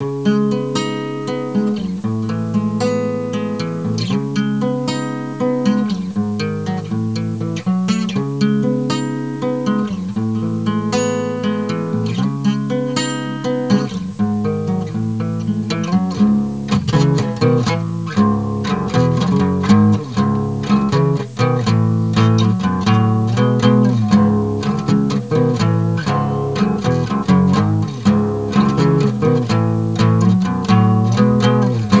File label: guitar